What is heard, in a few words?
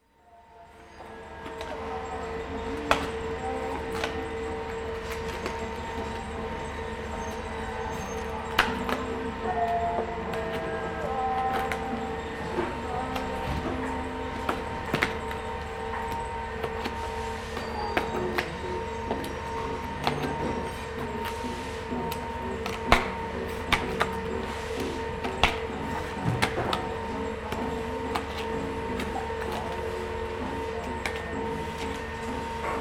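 GIRL PACKING WITH RADIO ON, GLASS FACTORY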